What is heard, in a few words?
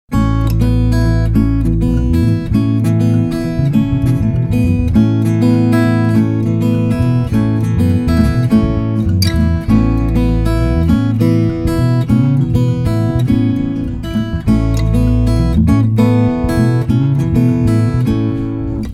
Only Intro Without Drums